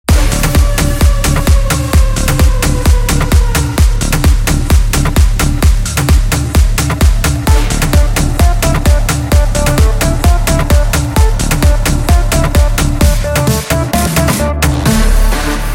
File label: Mashup; reverse impact friends mashup edit pack 2020 vol 1